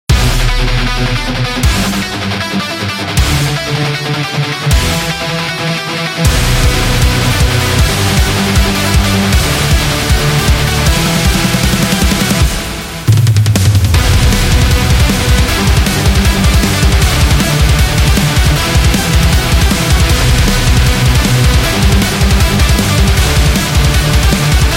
Heavy Metal Speed

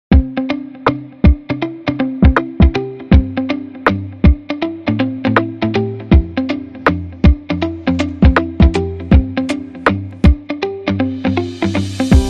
Corporate Hip Hop

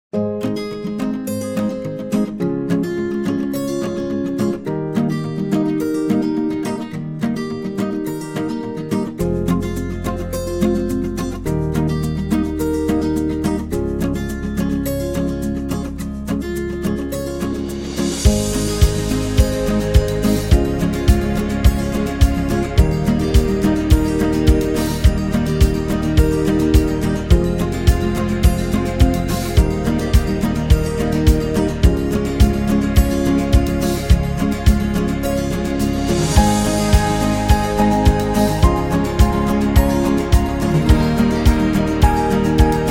Guitar; happy music vol 9

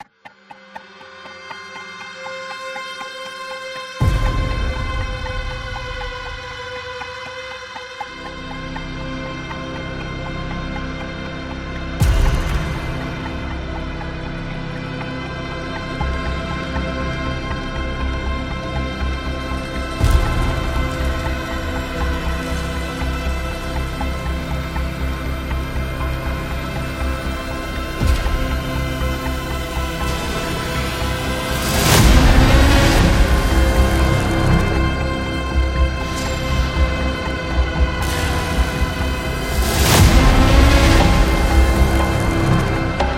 Dark Documentary

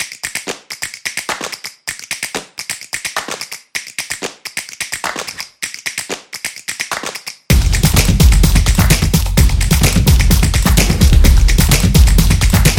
A Rhythm, percussive

